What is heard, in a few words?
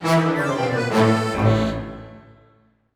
pirate ship mood stinger; lego movie videogame; playstation 3